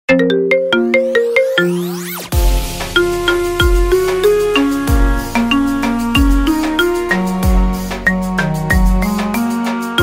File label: marimba remix ringtone